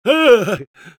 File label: pain severe, es; Engineer